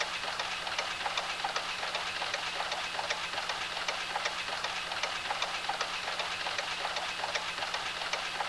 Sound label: knife idle; spknife